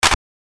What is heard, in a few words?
delay clap